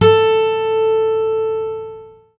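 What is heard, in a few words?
GUI Ac. A2, acoustic guitar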